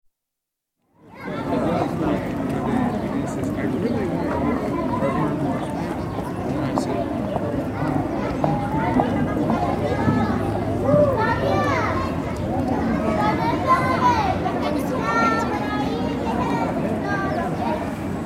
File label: Spain: Expectant crowd, exterior, general chatter with lots of children around; BBC